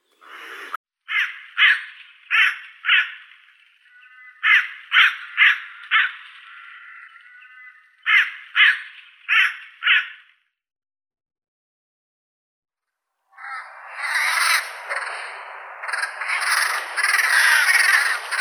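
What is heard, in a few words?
hooded crow, Corvus cornix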